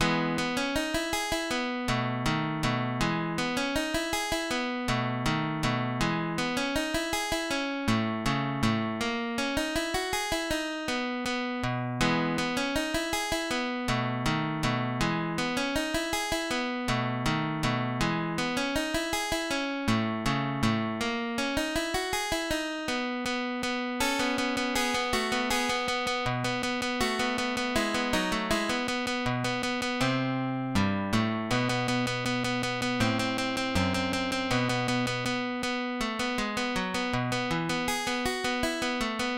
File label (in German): Gitarre Etuden E dur; Albert Heinrich